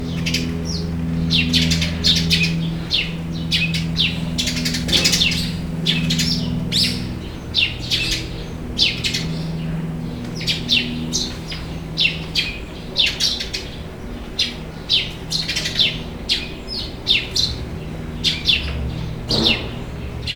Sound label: bird; 2mic